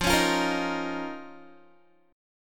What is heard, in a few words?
F 7th Suspended 4th Sharp 5th F down Guitar